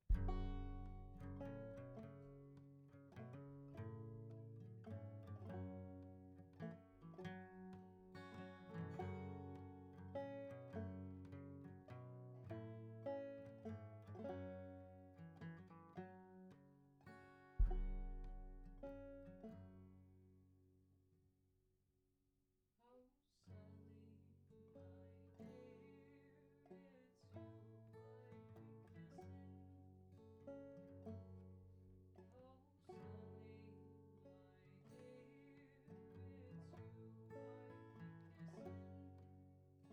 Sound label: Chordz